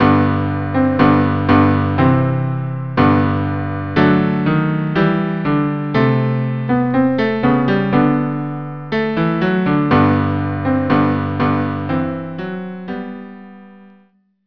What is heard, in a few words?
cello duo; St Anthony